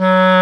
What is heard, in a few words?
WND CLAR, Clarinet Sh